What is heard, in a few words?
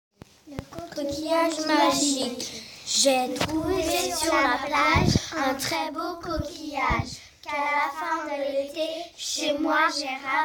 Vocal